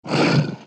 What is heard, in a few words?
roar, battle